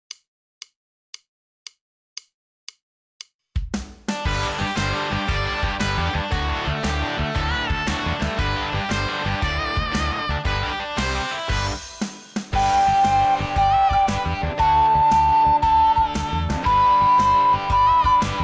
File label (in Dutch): Begeleiding blokfluit fruitlied met fluit